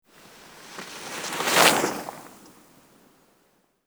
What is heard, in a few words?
Ski; iMovie '08 Sound Effects